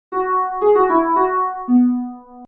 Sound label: happy papabear theme